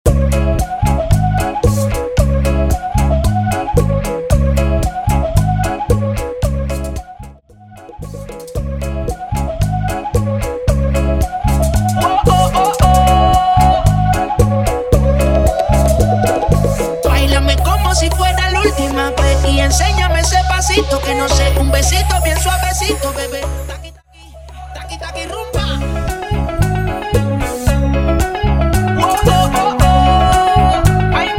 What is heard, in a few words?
Bachata Project 113 BPM; bootleg bachata remix